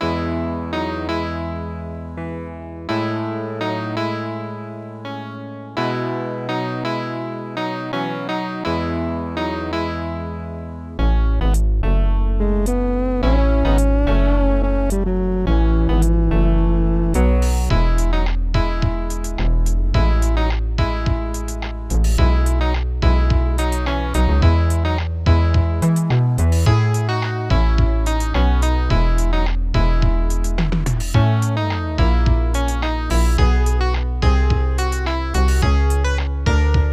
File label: Blues